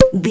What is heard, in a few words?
cork pop open bottle boing